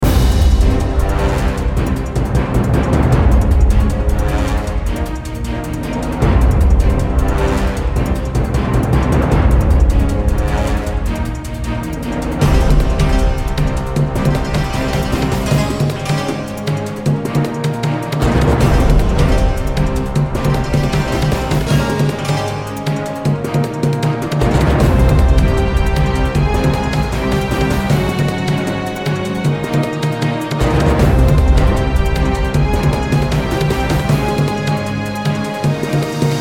Hunt Fight and Flee 1 Min Loop; Gun Frenzy

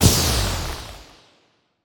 Planet Explosion

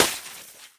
snowball land; Scrat

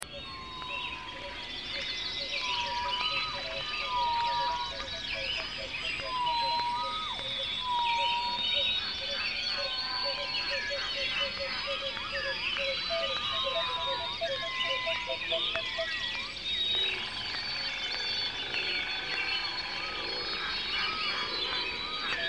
forest; Yavin IV

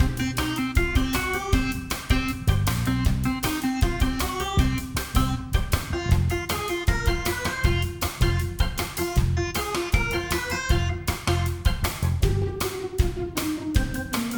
City Platforming MIXED; Ben 10

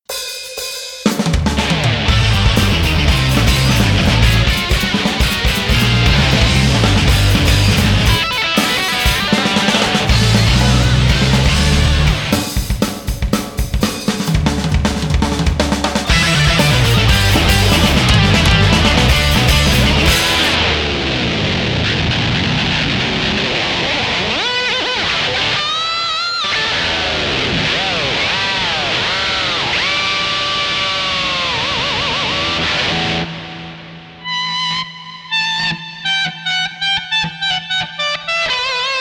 (Guitar Solo Version); Guitar Freaks XG2 & DrumMania XG2

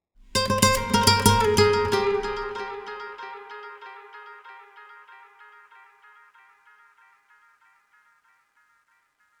Oneshot Acoustic Guitar; zombie army 4 dead war ps4 stadia switch windows xbox one gamerip 2020

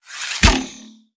Fight Attack Bow; Mighty Party; Android

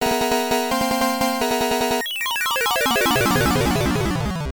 Round Start Music; Motos; x68000

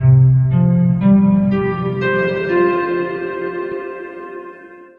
Stingers intro sting

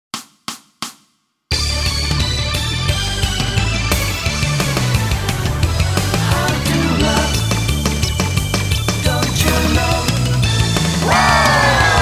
Guitar Minus Track

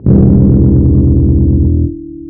bass stopping long with no noise

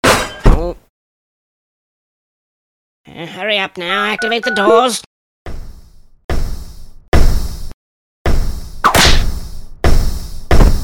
Hallway Run; Infiltrating The Airship

